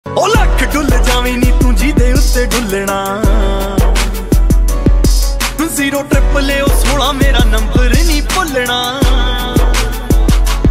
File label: Punjabi